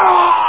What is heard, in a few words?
Man Hit; Amiga Games 1996 #7; AlienSpace